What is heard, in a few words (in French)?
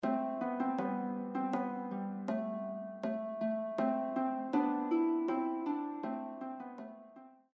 6 cordes, lyre; This 'call and response' song is an 'Andro' originating from Upper Brittany; Bonsoir au Maitre de Maison; 6 string; Lyre Academy